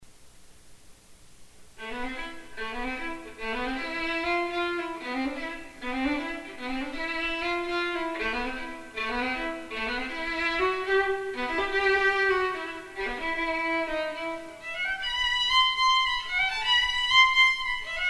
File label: Violin